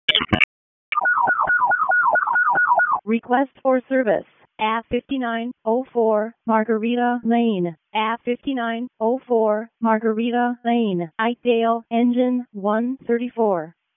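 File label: fire department alert; wake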